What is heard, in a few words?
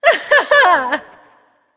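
heh heh, female; smite; voicepack; hera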